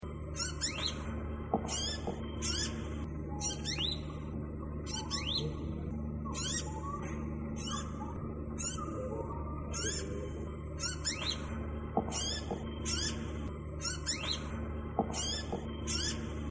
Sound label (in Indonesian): burung madu hitam